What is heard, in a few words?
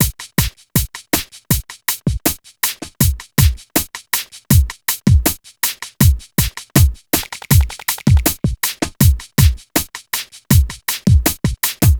E Coast HipHop Drums; Digidesign; Pro Tools; Pro Tools Essential Loops; East Coast